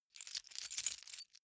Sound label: key ring large rattle soft; objekte; schluessel